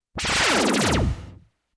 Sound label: POWERSHOT